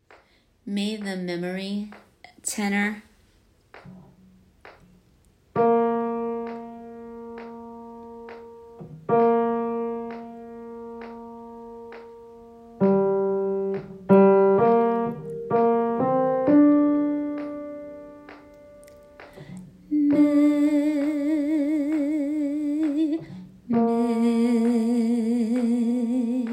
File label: Tenor; Veteran Day Shabbat